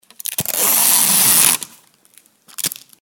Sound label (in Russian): otkleiv kuska scotcha